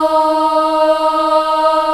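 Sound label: D#3 Choir "ah", Left; CHILD AHF; USB Soundscan vol.28; Choir Acoustic & Synth; AKAI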